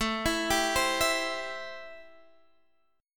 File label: A-Minor 7th A; arpeggiated; Am7